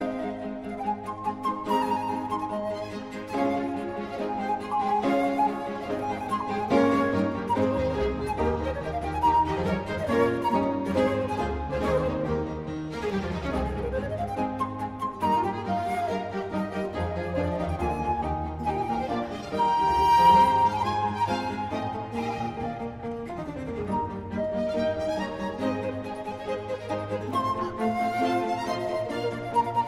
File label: Flute Concerto in A; Quantz